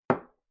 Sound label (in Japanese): グラスを置く音